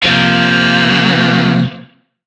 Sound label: Shawzin Hirajoshi Chord A; Music; DE; Warframe; Lizzie Shawzin